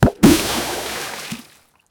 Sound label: big object is thrown in; big impact